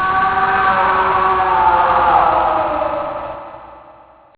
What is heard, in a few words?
death; Alien Arena; infantry